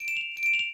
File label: wave